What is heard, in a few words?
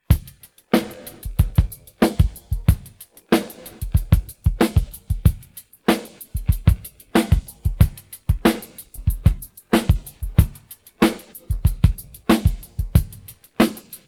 pattern, drums